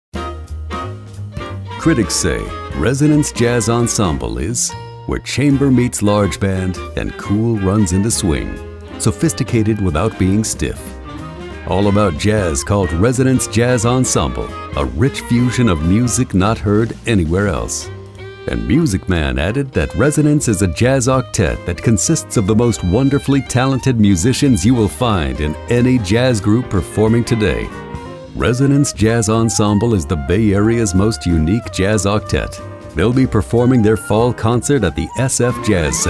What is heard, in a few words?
Resonance Jazz